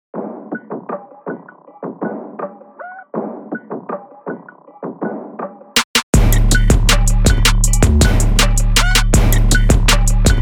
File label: trap